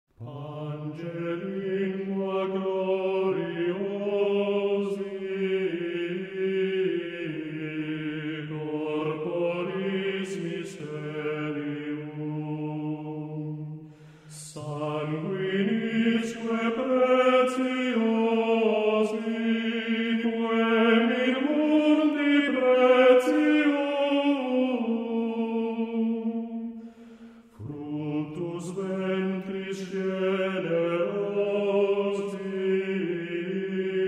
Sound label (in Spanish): Monódica, gregoriano; Anónimo gregoriano; Pange lingua